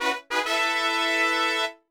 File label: Level up; Dungeon Explorer: Warriors of Ancient Arts